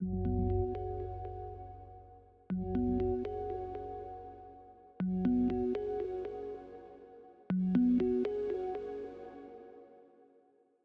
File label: Ringtone